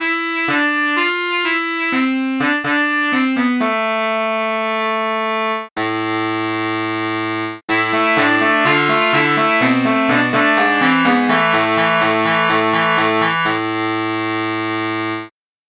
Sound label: baritone sax